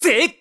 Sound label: Throw; Link; zelda sounds; Skyward Sword